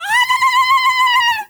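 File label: FEMALE RAVE; Spectrasonics; Heart of Africa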